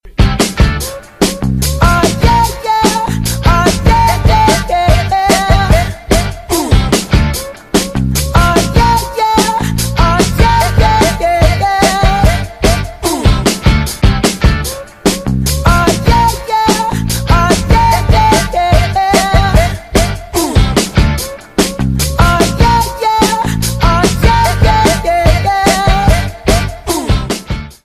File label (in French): sonnerie